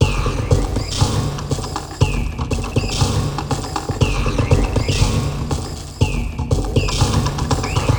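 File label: Loop, beats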